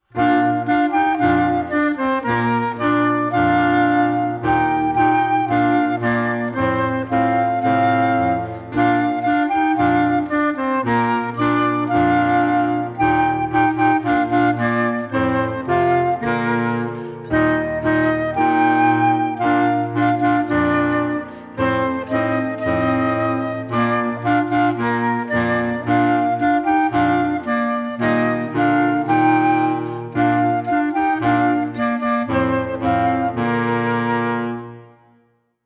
Woodwinds - Trio with Piano; Up on the Housetop

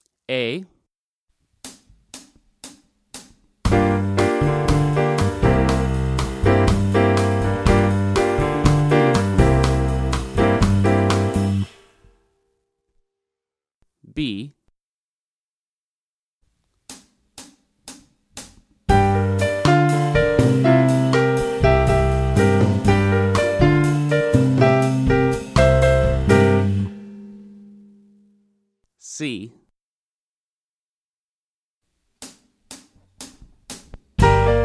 Sample Latin Styles

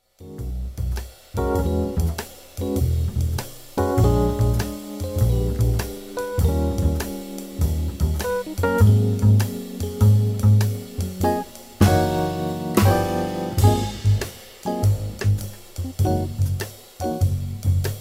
Boogie Woogie Bossa Nova

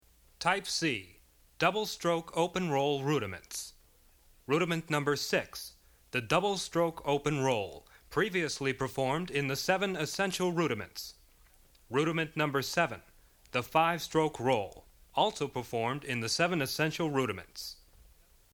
Double Stroke Open Roll Rudiments

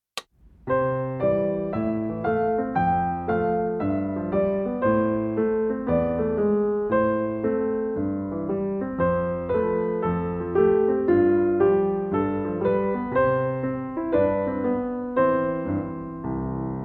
A Jazzy Tune

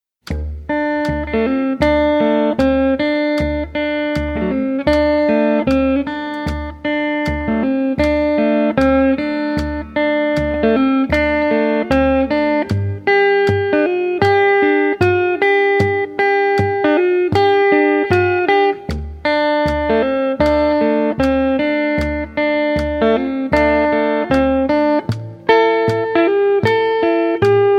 Open D Blues

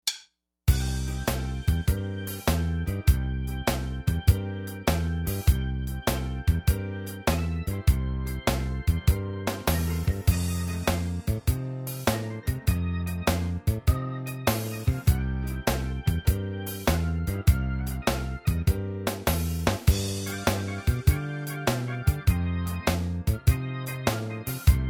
Blues in C

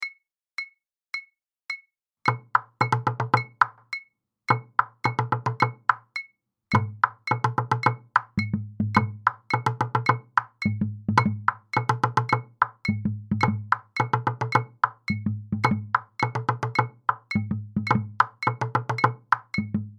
Group Groove 3 Part Duple

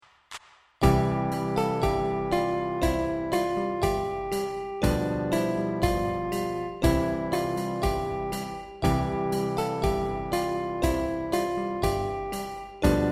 London Bridge

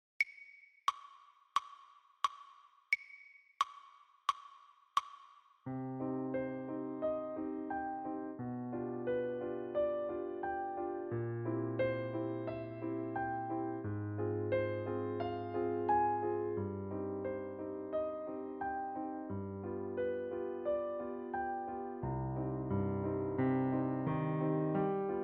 Prelude In C Minor

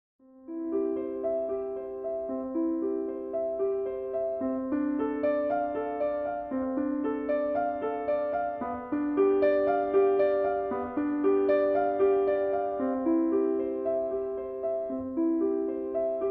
Prelude in C Major; from the Well-Tempered Clavier

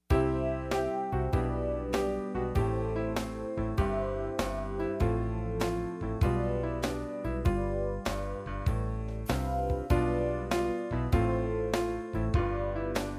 Sample Improv Performance

